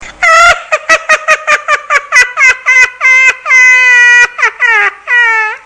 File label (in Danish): sjove grin dommer